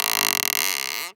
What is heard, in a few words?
forest tree wood texture creaking; Bluezone Corporation; Nature